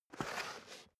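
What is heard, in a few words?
step boots concrete slide long, footsteps; menschen; schritte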